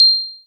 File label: trap alarm